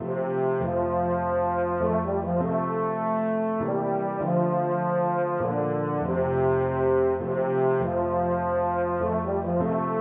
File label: baritone, baritone horn; amazing grace